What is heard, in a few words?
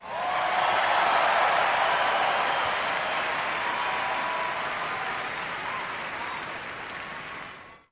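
Crowd 50000 Whistle, large int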